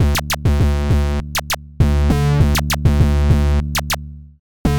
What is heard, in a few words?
laser bass